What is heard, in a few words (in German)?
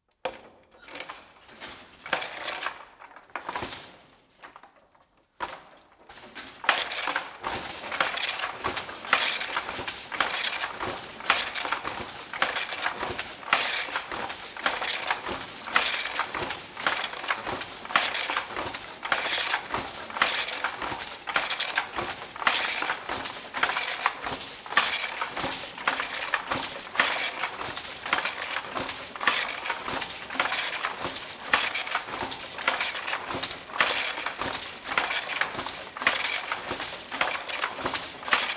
Textilmuseum Webstuhl; Brandenburgisches Textilmuseum Forst